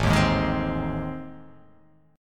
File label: A Minor Major 7th Flat 5th